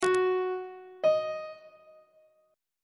relative minor of g flat major is e flat on treble clef